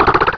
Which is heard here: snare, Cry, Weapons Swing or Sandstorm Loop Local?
Cry